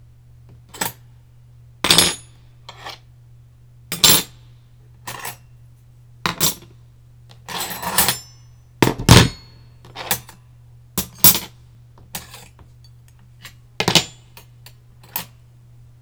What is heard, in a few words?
Sorting Silverware